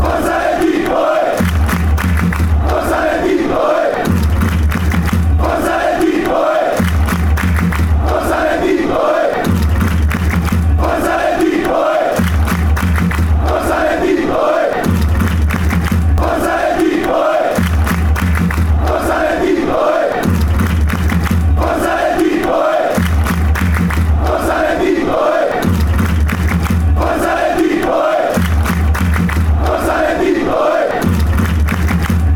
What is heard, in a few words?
atleti ole, chants